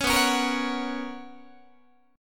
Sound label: B Major 7th Suspended 2nd B Guitar; strummed; 4A,4E,5A,5D,5G#,6C#; 16,19,16,20,17,17; JGuitar